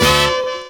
HORNHIT; E-Lab; X Static Goldmine 2; akai

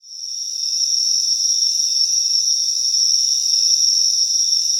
ATMOPAD; Best Service; XX Large; Pads

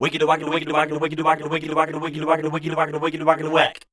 SCAT #D, Voice

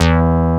CZ BASS; ZERO-G; Datafile

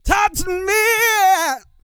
SING

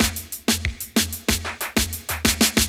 BREAK

